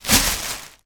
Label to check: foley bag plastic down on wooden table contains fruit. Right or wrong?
Right